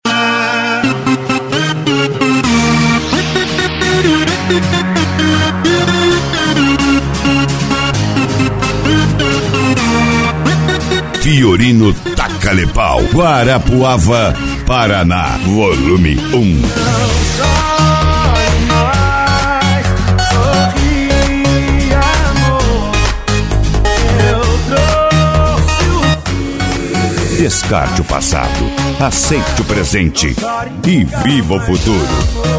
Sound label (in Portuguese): especial funk e electro house